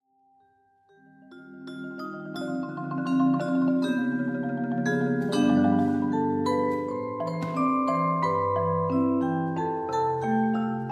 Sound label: marimba vibraphone